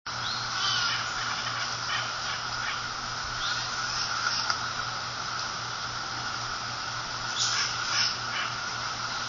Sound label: nuthatch, white breasted; birdsongs